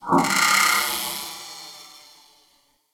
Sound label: Oro Reactor Core Small Stop; Warframe; Orokin